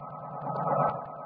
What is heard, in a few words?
sound possession; replicaisland